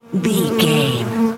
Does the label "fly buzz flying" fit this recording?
Yes